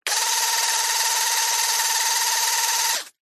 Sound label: industrial power tool small drill etc burst